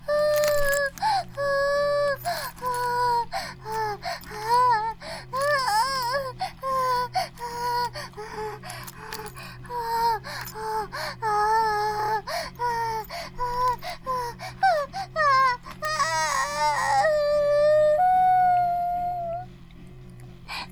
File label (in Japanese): バイブ放置; イクたびにわけて